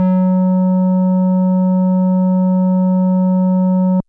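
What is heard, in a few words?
FM Sq Dist DX100 F#0; square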